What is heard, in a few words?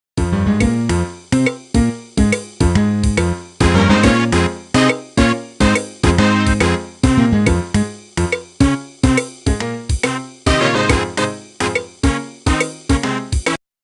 Motorola One Moment